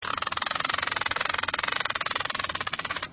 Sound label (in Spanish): Sonidos De Delfines